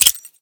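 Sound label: pin, grenade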